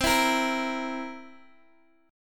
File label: C 7th C, Guitar DADGAD, chord